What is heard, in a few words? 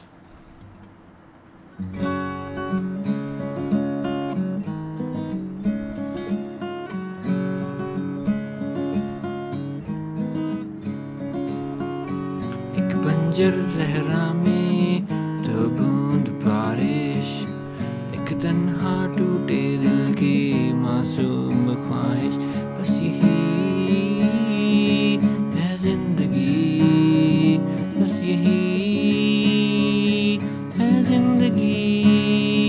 zindagi acoustic